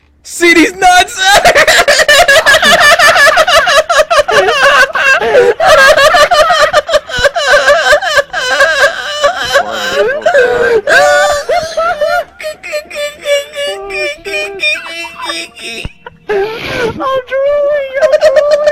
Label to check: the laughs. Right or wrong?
Right